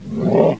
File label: Wizard Attack